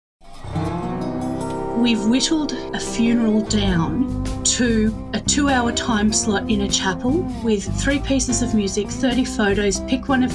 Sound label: trailer instrumental; SBS Audio